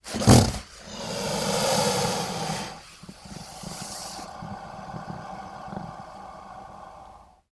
Alligator Snort, Breath. A Snort Followed By A Long Breath. Close Perspective.